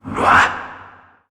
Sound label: Vocal Attack; Warframe; PriestQuest; PossessedRedVeil